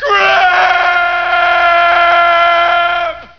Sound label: fall